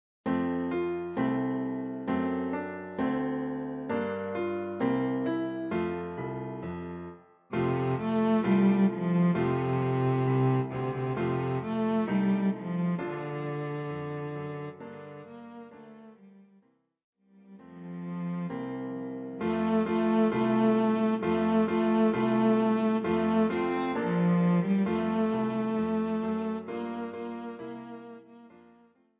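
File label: cello; Jingle Bells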